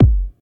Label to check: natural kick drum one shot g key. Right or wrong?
Right